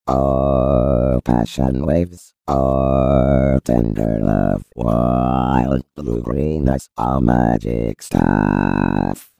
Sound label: Low Tune (voice only)